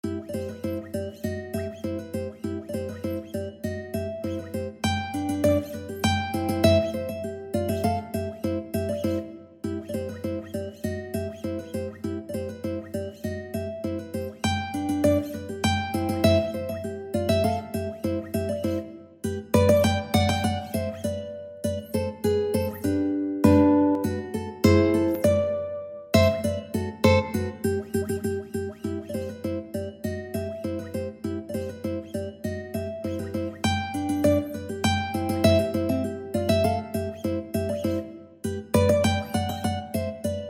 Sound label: mandolin duet